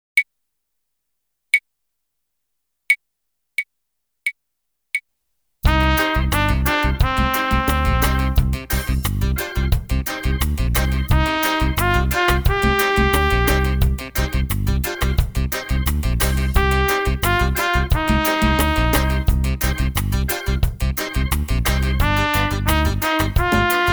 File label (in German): Echo Ubung